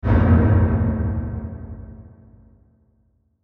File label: Hit Filter Ring Reverb Smooth; Blastwave